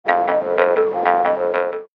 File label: bamboo jews harp